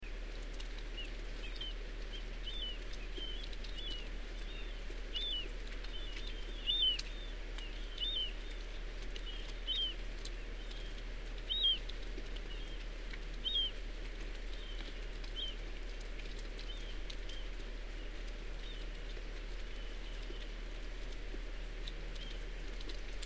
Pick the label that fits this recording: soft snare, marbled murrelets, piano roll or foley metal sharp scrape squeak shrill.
marbled murrelets